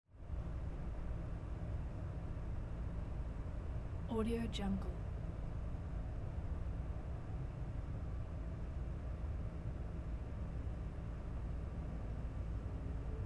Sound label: block of flats area night city ambience